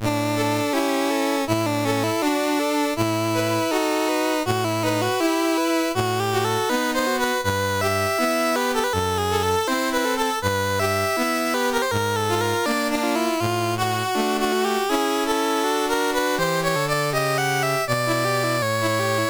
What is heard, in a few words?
violin concerto in D, mus CSG8580R5; Violin Concerto in D Op35 1st Movement Alegro Moderato Pt4